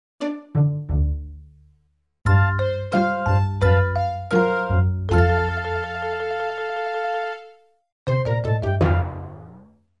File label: Yacht Game Failure; The Wind Waker